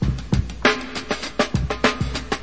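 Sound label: drum loop classic breakbeat calm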